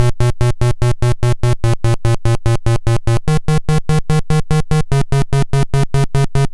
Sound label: Apple II Bass, Chiptune